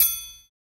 Triangle; Percs

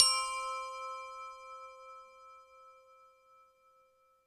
Phat Synth

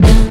Hit

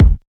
kick

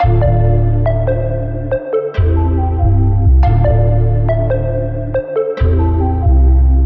Melody Loop - 140 BPM D Min; Cymatics - Astro Butterfly

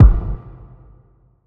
Scary Kick, Kicks

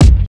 KIK

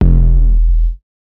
808s

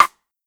Rim, Percs

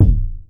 Outlaw Kick; Kicks; CDK

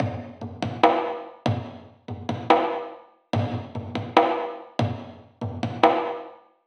Perc Loop; Percs

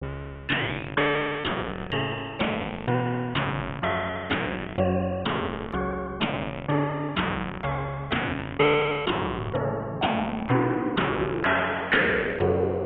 MELODY 120

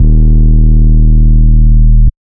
808s

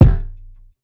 Rugged Kick; Kicks

